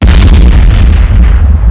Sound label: takeoff